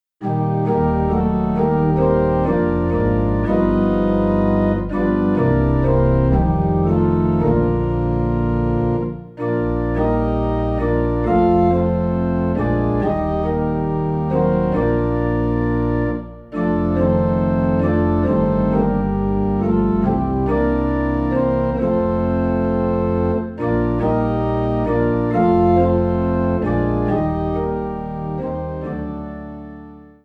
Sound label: Organ Low in B Flat; Living for Jesus